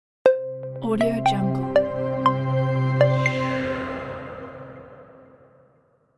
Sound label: digital reveal logo